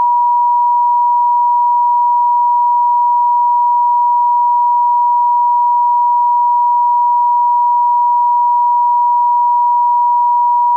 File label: solfeggio frequency 963hz; crownchakra; higher; Chakra Healing